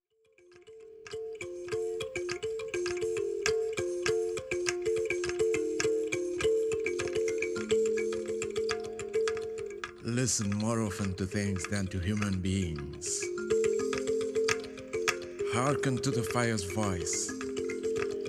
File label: Breaths